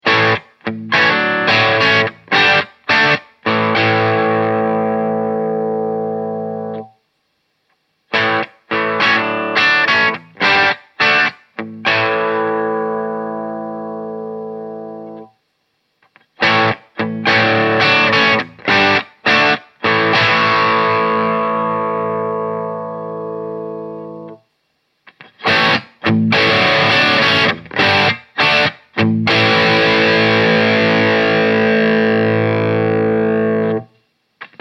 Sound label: Blues 3 Tube Stages 1 5